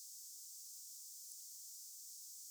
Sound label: algae ping